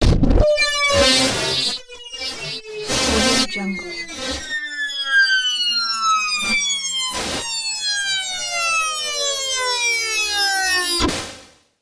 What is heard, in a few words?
energy synth discharge on off; soundmorph